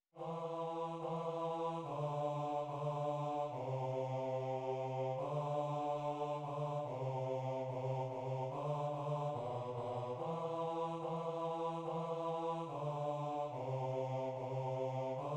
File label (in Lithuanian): Bosas Šv Augustino mišios Dievo Avinėli; angelu sargu giesmes